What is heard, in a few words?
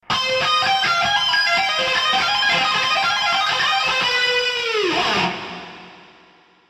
lick; brutal legend